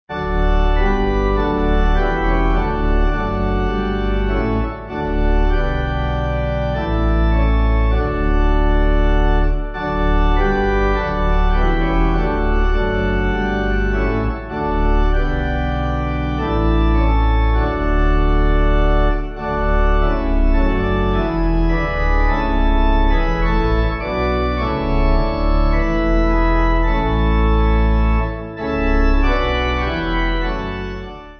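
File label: Pipe, hymn backing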